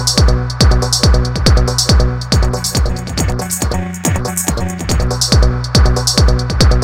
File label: Beat